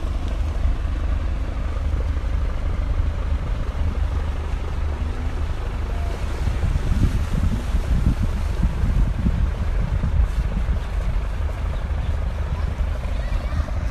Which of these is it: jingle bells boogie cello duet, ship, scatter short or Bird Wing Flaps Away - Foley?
ship